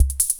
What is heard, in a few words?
DISCO BEAT